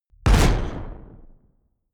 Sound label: sci-fi gun mechanic fire hard; pistolen gewehre